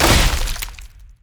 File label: basicattack; Yorick